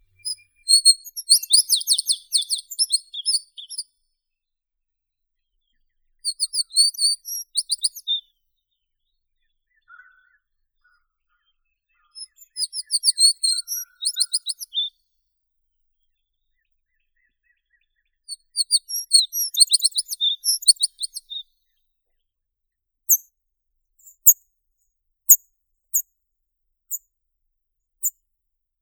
ovsyanka drevesnaya vorobinaya spizella arborea

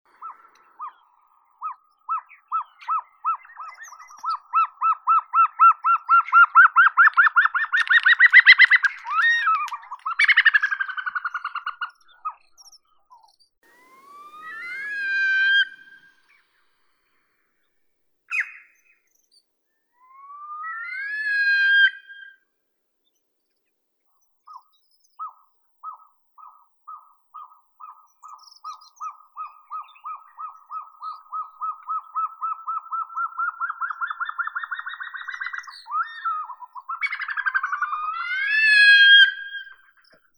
ular altaiskii tetraogallus altaicus, zvuki prirodi

